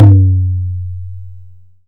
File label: Indian Percussion Tabla; Mango Loops